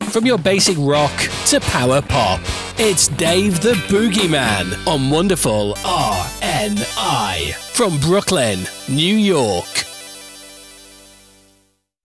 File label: Basic Rock to Power Pop Bed